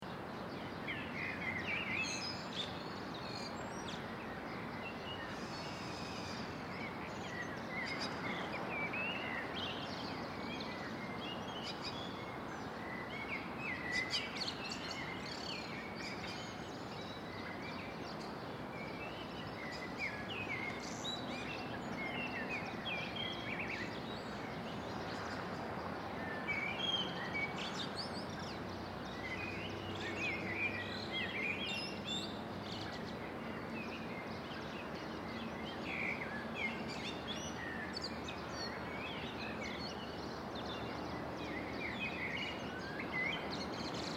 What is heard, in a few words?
Residential Morning Birds Loop, Sunrise birds in residentia; Sunrise birds in residential area about 1/2 mile from freeway traffic. Seamlessly loo; Bird Sound Effects